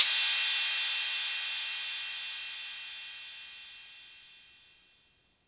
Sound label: Cymbal Ring Long; Lost Tapes From Mars